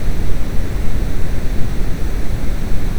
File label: waterfall